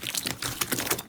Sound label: slimy; ludum dare 46; Boss; SlimeBoss